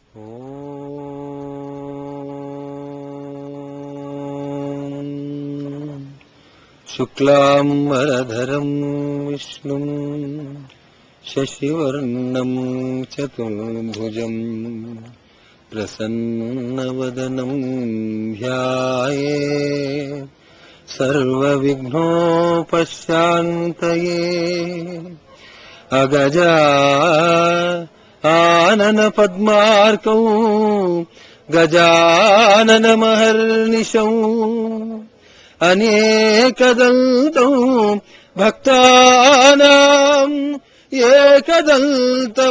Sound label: opening chant; Bhajan